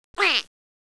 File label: Quack